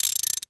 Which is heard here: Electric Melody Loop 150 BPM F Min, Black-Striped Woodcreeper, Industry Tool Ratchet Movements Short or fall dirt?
Industry Tool Ratchet Movements Short